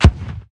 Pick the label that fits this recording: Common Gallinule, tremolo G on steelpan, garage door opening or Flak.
Flak